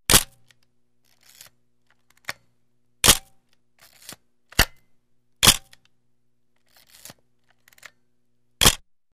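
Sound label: camera shutter, frame advance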